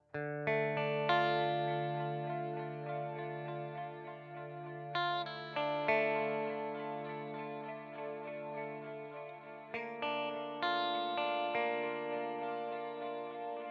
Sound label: Modulated Reverb Source Audio Ventris